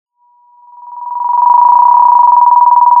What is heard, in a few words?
Sound of a phone ringing